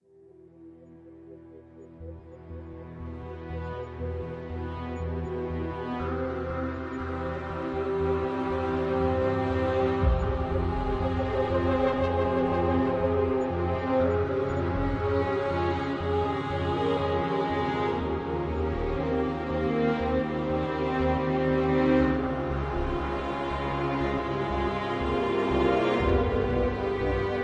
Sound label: God Ambient